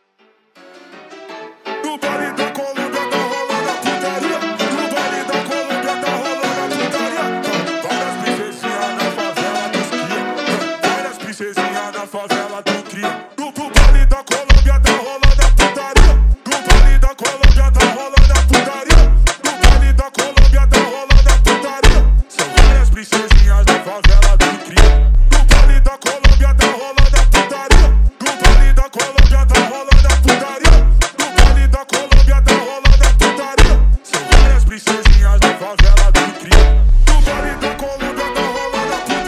Funk slowed

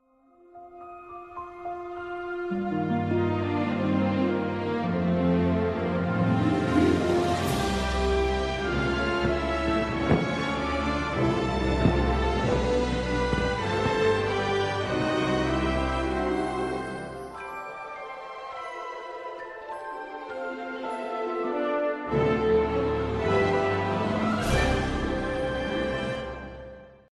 sms, ringtones; Disney

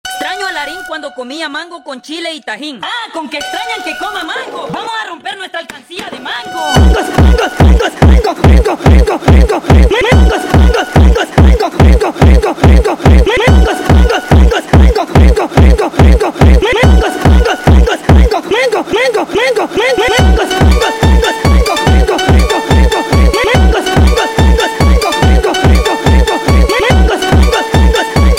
Phonk sped Up

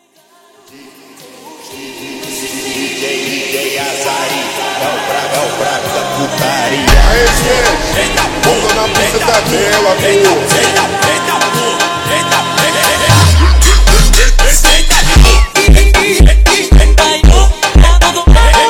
FUNK SLOWED

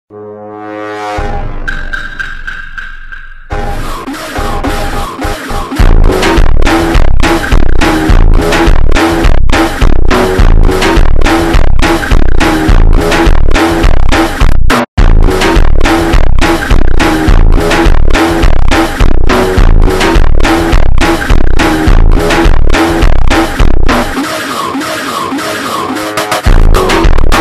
Funk slowed

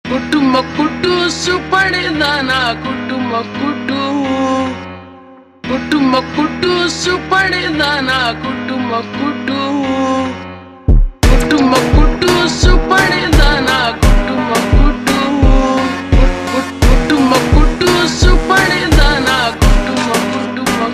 Funk ultra Slowed

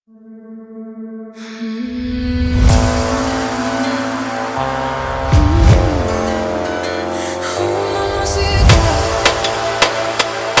Funk slowed

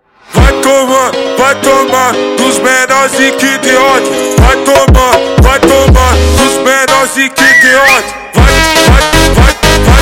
slowed

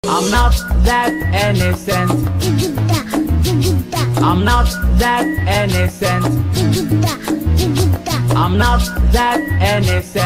VOCALOID bassboost

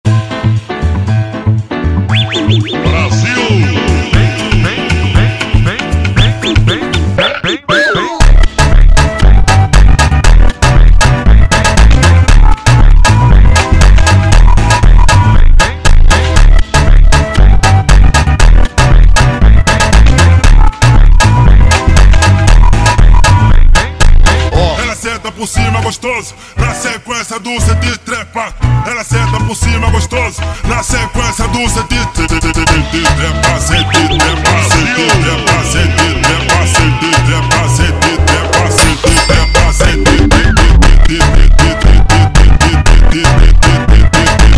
Funk slowed

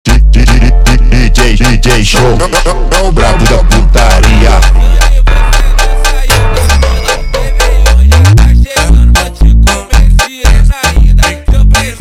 Funk slowed

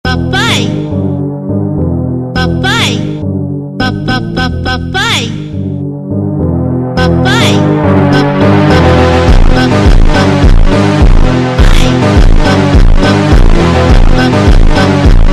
Automotivo slowed

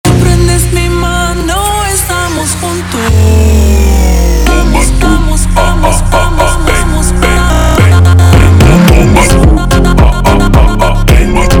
super Slowed

